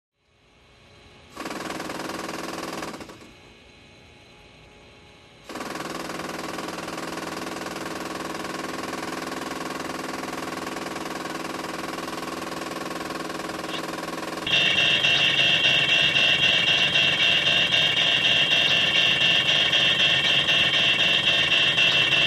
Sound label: Aircraft Machine Repair Maintenance